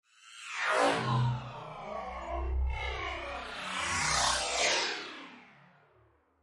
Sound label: Storms Meteor